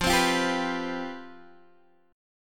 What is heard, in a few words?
C Major 7th Suspended 4th, F, guitar chord